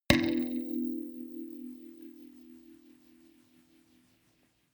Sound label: notification kalimba rattle body hit